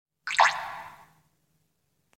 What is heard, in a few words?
Drip